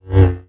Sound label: swing